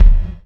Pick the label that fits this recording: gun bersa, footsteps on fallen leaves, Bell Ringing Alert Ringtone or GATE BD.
GATE BD